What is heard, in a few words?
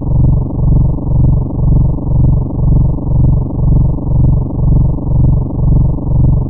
ambience electrical